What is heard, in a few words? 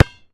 Street Ball Bounce Asphalt; SFX Kit; Street Basketball